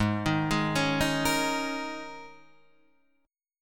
Ab Suspended 2nd Suspended 4th Ab down Guitar Standard; arpeggiated; JGuitar